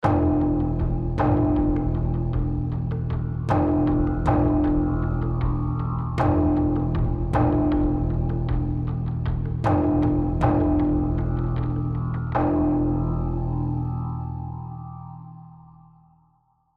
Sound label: Rage Combat